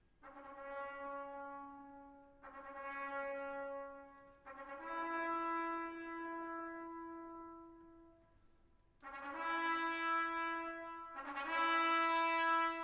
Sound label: Symphony in C Sharp Minor; Symphony No. 5; Mahler; I. Trauer